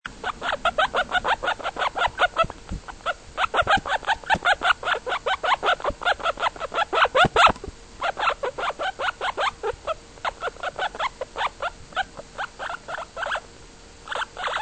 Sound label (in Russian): murchanie morskoj svinki